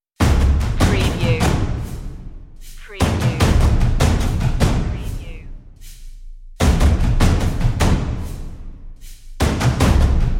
drums of destruction less drums

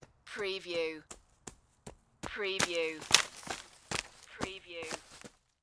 Footstep Forest Run

